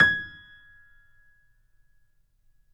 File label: Grand Piano Mf G#5; AKAI S6000 CD-ROM - Volume 2; Grand Piano 256M